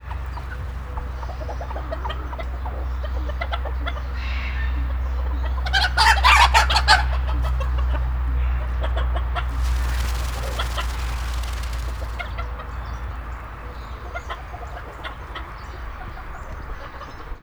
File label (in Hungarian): fehér páva, széttárt tollát rezegteti; állathangok; nyíregyházi zoo 2010